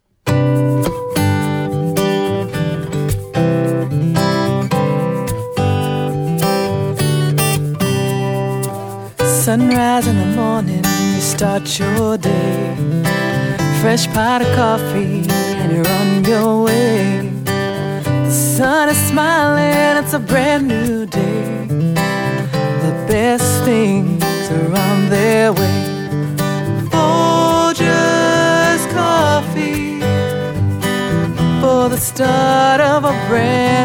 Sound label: Jingle; Folgers; Jingle Contest